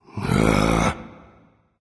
ugh; smite; voicepack; vulcan

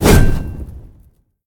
swing buff; smite; gilgamesh

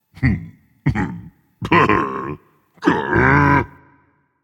boohoo, dragon; fafnir

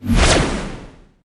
basic fire; smite; chronos

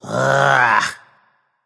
nv ugh; smite; voicepack; ganesha

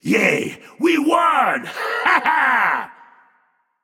yay; smite; agni

